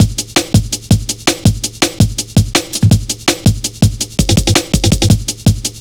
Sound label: 165, drum loops, drum & bass; Total Drum&Bass